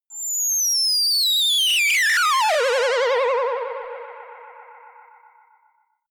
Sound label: ululation ufo